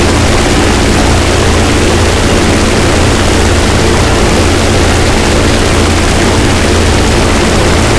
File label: engine bomber rpm close; War Thunder; engines